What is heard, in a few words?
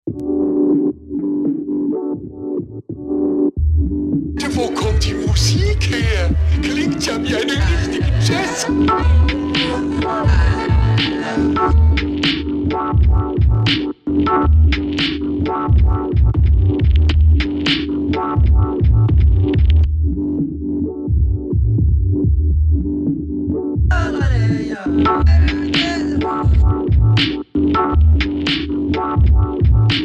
Like Jazz